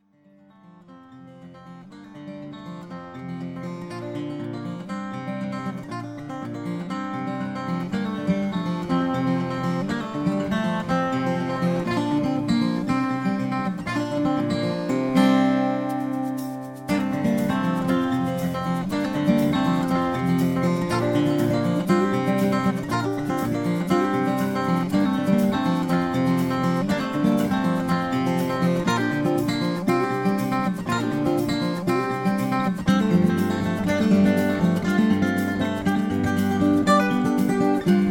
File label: Country Tune